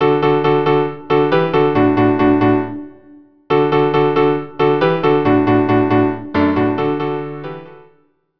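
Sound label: viola piano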